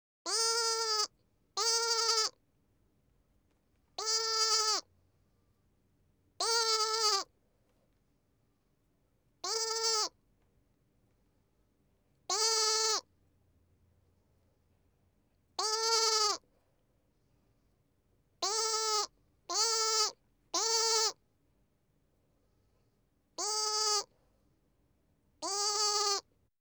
Animals, Lamb; BBC Sound Effects Library

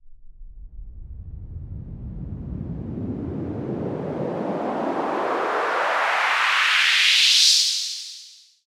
Short Sweep, White Noise

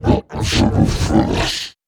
Talking Mutant, Dark Creature Sounds; Mono; Audiomeals

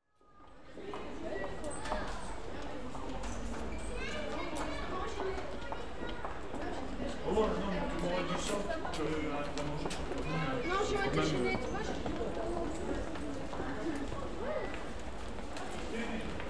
France, Pedestrian Precinct; European Soundscapes; BBC Sound Effects Library

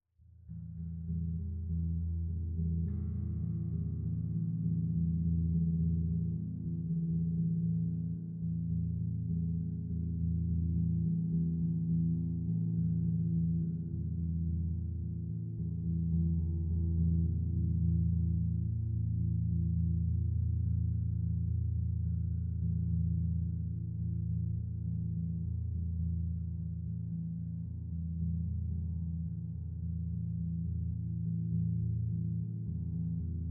misc, Standard, DI, body tones, moderate; Eiravaein Sound; Yvaine

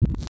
Tech, Weird Side Samples

